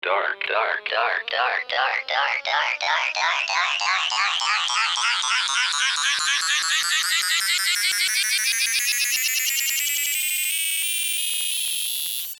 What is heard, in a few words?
pitch rise